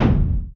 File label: Footstep; Blastmorph Pinball; xbox series xs